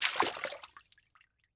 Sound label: Liquid Water Water Pour Plastic Cup Into Pool